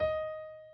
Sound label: key